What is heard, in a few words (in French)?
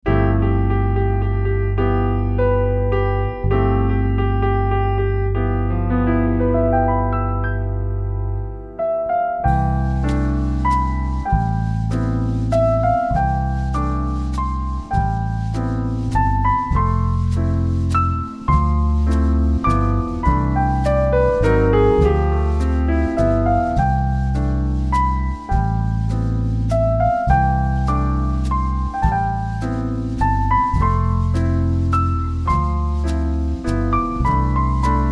BERCEUSE